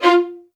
F4, LOUD VIOLINS; Q Up Arts; Denny Jaeger Master Violin Library

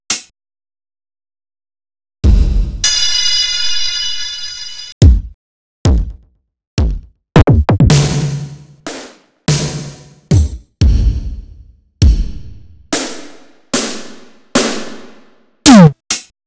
Yamaha PSR E3051

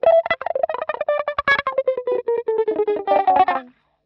wah grind; PowerFX - Nu Jazz House

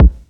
Kick, Kickdrum; Equipped Music; Loungin House

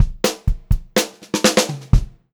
126 PO, Pop-Rock Drumloops

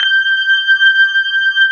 B3 HAM, Keyboards Old School

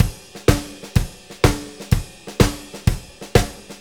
Blues Loop, Full Kit Loops; Drum Tools

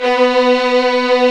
STR SW VLN, Vlns 8 fff, Agitato; String Master Series

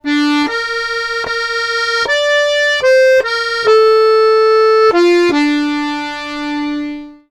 Accordions; USB Soundscan vol.40; AKAI

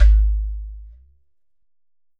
GHATAM

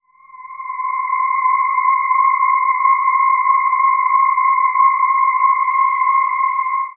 Enoesque C, Ambient; Trance Formation

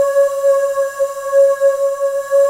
SYN VOI, Roland JD 800; Sound & Vision - Gigapack I CD 2; JD 800/990

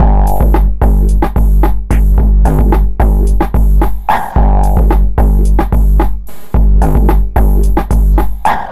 machine madness 110bpm, downbeat dance grooves

